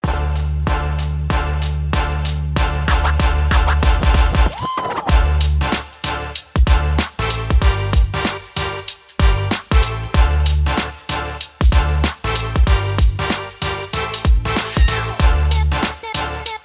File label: electro energy cyberpunk